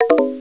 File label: new message